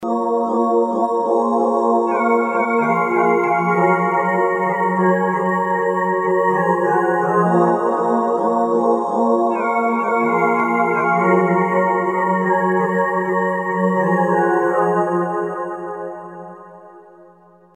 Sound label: choir, roland jv2080